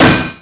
SPIT SHOT

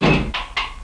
open hatch; PanzerE; Desert; CD-Gamer 1999-10

